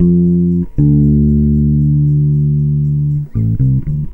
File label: TRIB BASSE, Roots Disco; USB Soundscan vol.32; AKAI